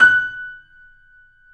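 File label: piano, F#, right; InVision Interactive - The Piano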